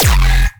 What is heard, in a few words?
bass hit almost a kick drum F; Virtual Riot